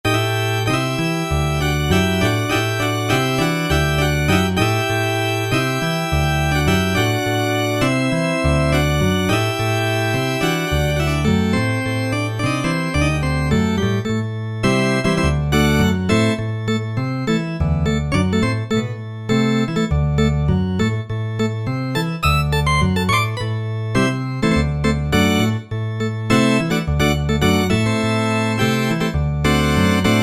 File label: Roland SRX-07 Continental; Roland SRX-07 Ultimate Keys